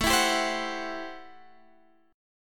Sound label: A-Minor 7th Add 6th, chord; Am7 Add6 strummed; JGuitar